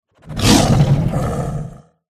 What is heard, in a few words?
pet panther buff; pet pantera